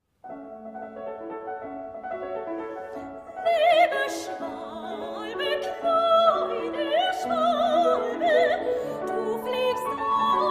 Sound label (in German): Walzer Gesänge, Klaviermusik; alexander zemlinsky; liebe schwalbe